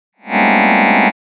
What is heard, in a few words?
cluster closed semi E